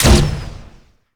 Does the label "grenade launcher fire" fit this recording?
Yes